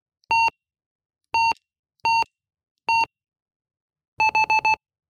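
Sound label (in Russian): звук домофона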